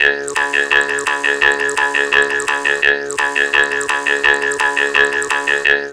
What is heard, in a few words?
Jaw Harp Mouth; Total Drum&Bass